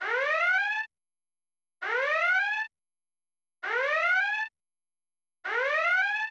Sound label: Red Alert Sound; Star Trek